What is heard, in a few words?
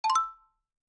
score a point game